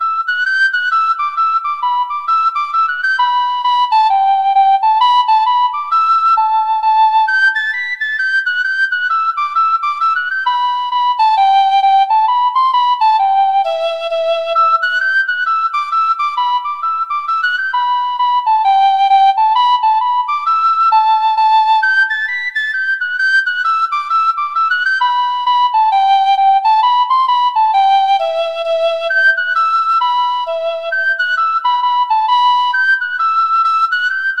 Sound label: tin whistle